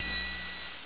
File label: shark ding; austrlia